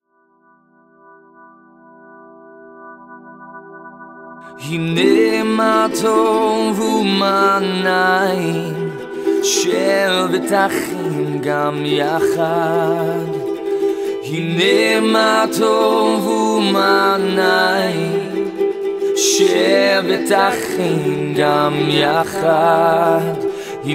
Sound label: psalm reggae